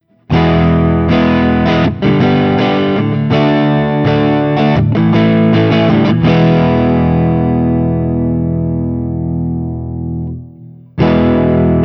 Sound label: Guild X92 Citron, Clean, Open Chords; Guild X92 Citron Breakaway from the depths of 1984; ODS100 Clean; the not-a-tone knob is on 10